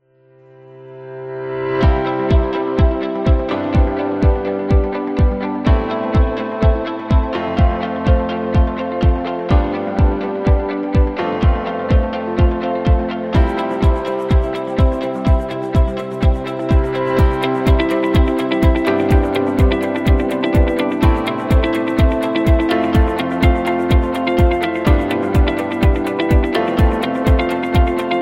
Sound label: Corporate Innovation, corporate music; corporate music vol 14